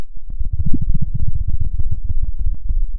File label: pop, Sound of a dinosaur